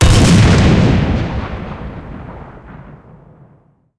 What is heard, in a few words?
cannon 57mm qf6 shot, cannons tanks